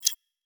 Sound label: ui pick